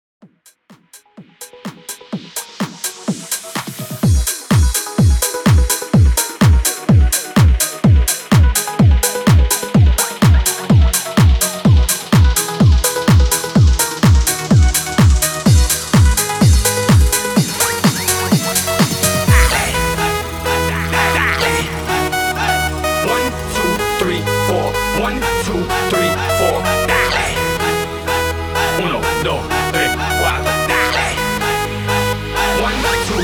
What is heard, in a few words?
MASHUP, 126